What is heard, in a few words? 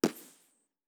Mouth Puff